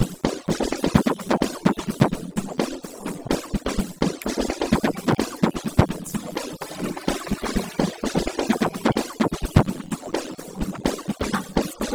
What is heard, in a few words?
celebrate percussive